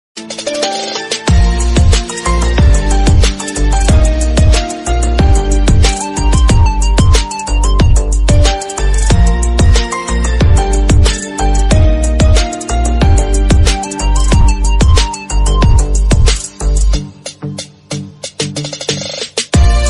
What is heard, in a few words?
romantic instrumental music dhun ringtone, hindi ringtone